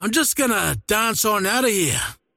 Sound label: teleport pain; dota; largo